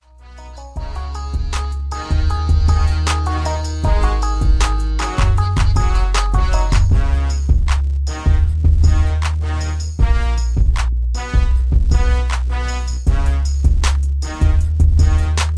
78BPM

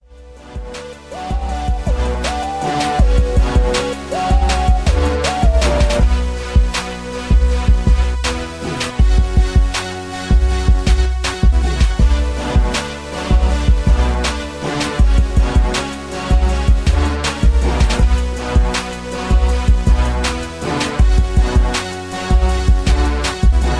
80BPM

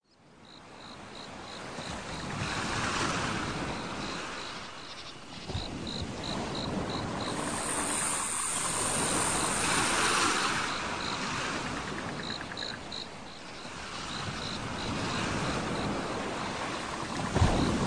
Tropical Waves

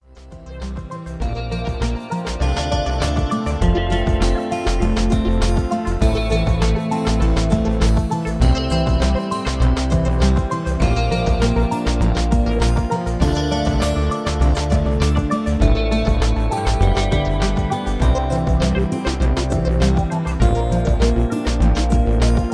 Electro Ambient

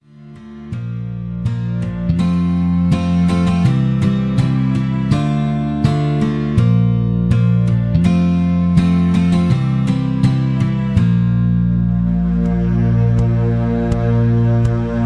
INSTRUMENTAL

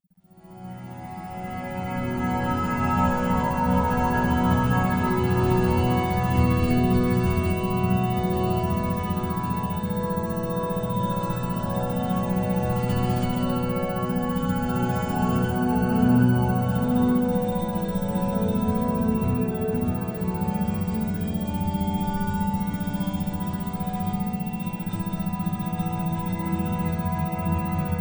Energizer Isochronic Tones Brainwave Entrainment

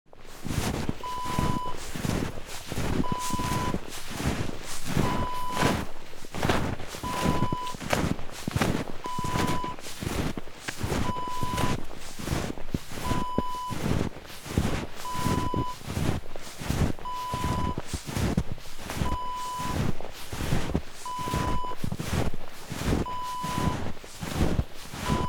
footsteps in snow

